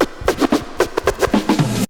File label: scratch fills; SCRACTH FILLS; Megabass Remix! 2